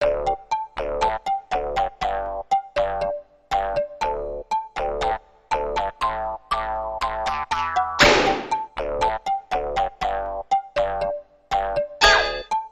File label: Goofy Loud Tune